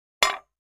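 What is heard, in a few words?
Large Tile Square Drop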